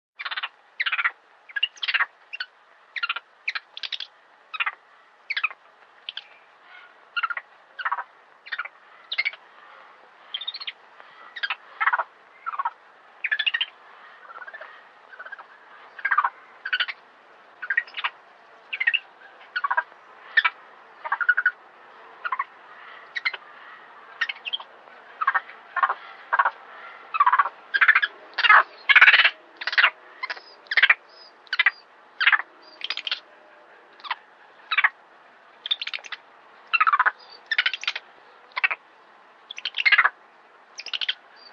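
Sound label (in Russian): obezjana izdaet interesnye zvuki, monkey making interesting sounds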